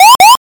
warning

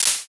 clap

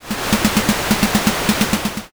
train run

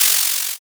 paper tear

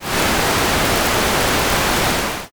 fire

